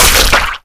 zombie blood spray; player; splat; source engine; left 4 dead 2; survivor

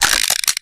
grappling hook reel stop; tf

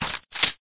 p228 clip in, weapons; Source Engine; cstrike

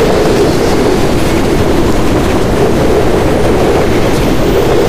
player fall whoosh

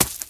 gravel, footsteps run

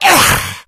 death, infected

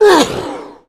tank pain; Left 4 Dead; Source Engine

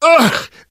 hurt minor, survivor; voice; source engine; left 4 dead 2; namvet

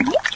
underwater menu popup; windows 9x; sound schemes

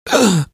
pain, barney; hl2; Source engine

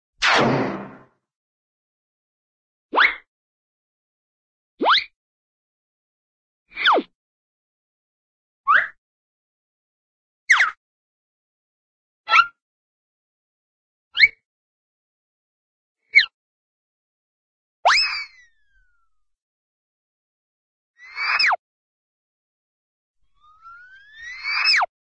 zap, cartoon big kazam

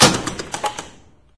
gear engage, caves; ambient; source engine; ep2

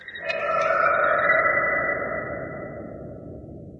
dist grub, ambient, caves; source engine; ep2

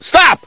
scientist pain; Source Engine; bshift

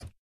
heavy taunt chest hit

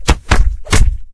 citizen punches, voices; Source Engine; video game

